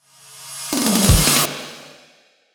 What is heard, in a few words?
Bossrush intro; CrossCode; Switch PS4 Xbox One PC macOS Xbox Series X Linux